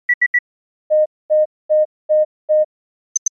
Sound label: Warning Multi Beeps